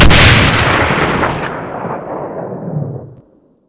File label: spring shoot, weapons; dod